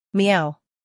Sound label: meow